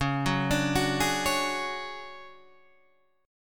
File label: D Major 7th Flat 5th, chord